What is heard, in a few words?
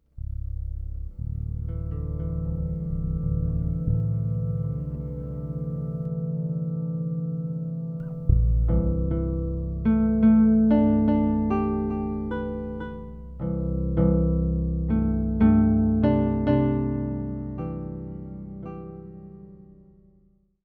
Color Song Interlude